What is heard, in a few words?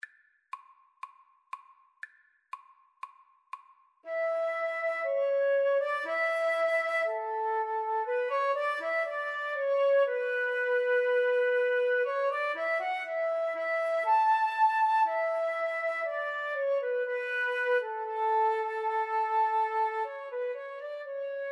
Violin Flute Duet